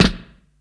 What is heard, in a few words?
Snare, drums; Ableton Live 12 Lite Core Library